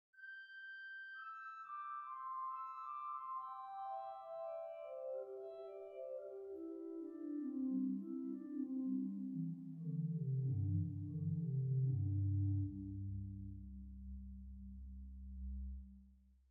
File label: Pos Cor de nuit, hauptwerk